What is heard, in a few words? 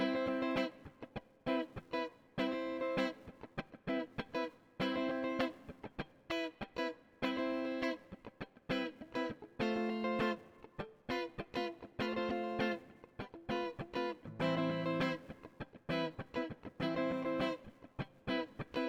Guitar Rythm Reverb